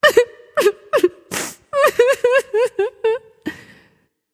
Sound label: boohoo; smite; voicepack; hera